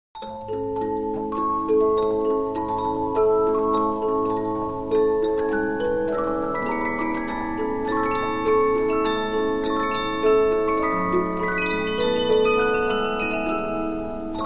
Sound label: Polyphon, 15inch disc musicbox